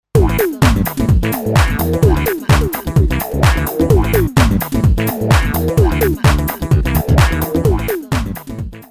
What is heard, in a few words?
Electribe SX House